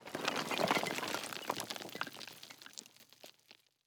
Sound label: impacts; World of Tanks